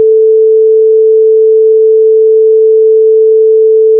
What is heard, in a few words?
sinus; minetest; devtest; soundstuff